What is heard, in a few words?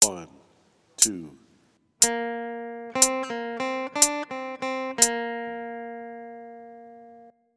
Melodic Dictation